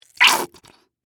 Lotion Bottle, Foley, Lotion, Bottle, Squirt; Primping; Human

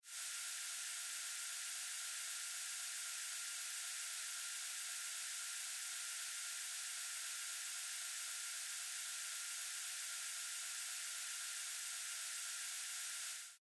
Industry Air Can Expel Slow, hisse; Hisses

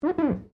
GLASS SQUEAK FINGER

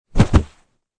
Body Fall Human; Wrestling and Struggling; Combat

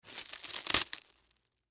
Plastic Wrap Tear, Plastic Wrap Tear From Box; Materials; Household; Blastwave

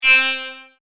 Intense Laser Harp One Pluck; Laser Gun Sound Effects; science fiction sound effect

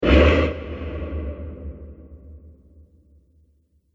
Fire Breathing Monster; Monster Sound Effects

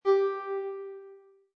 Musical, Button sound or menu sound; Multimedia

